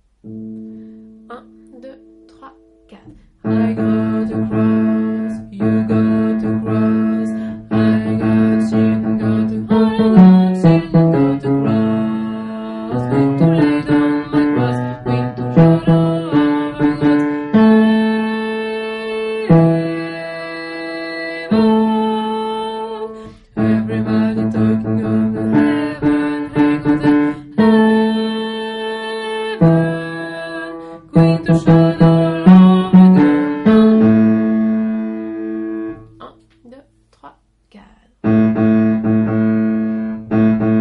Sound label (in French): basse; Shout all over gods heaven